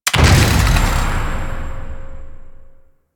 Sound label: Choose Character Choice; Warframe; NineteenNinetyNine; Stage9ChooseYourCharacter